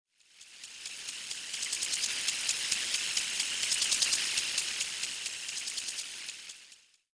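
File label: sprinkler, residential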